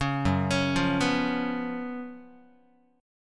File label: F sharp Major 7th Suspended 4th, Guitar; F#M7sus4 arpeggiated; 4,2,4,3,3,x